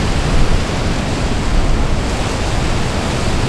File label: VENICE, Ocean & Brook; SFX